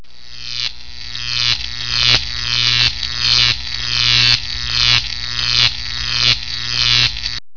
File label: electro shock